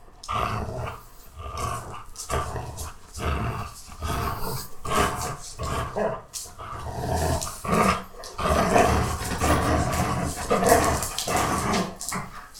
anger dog

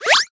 power up, retro synth

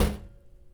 Metal Thunk; BB Retail Therapy Sample Pack

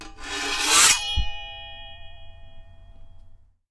Scrape, Japanese Pull Saw

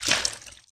splash; water splash slime sfx

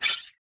sci-fi sfx

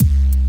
Bassy Kick; Drums; BB 2HTC Samples Vol 4 Addendum

